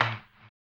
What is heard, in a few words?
HAMBONE; Blues & Soul